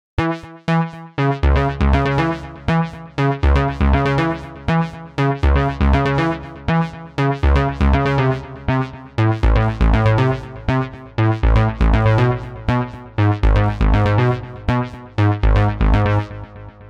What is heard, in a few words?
seq groove variation